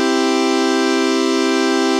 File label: input chord